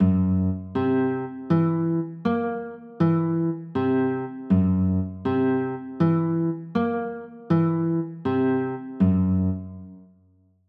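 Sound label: G flat 7b5 chord, guitar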